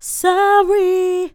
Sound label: Vocals Dry; GhostHack